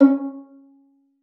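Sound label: violin pizzicato C#5; Xsample VOL-5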